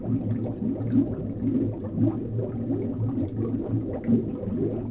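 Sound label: ambience kolto tank; Star Wars Knights Of The Old Republic II; XBOX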